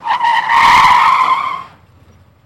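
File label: Cadillac Skid To Stop; Cadillac 1972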